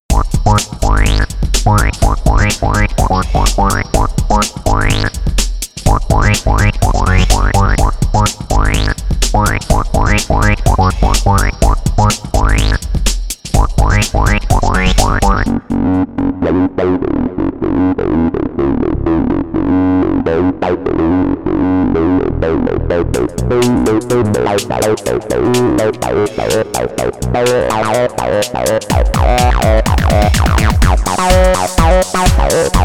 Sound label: midibox sid